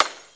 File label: glass hammer, crashes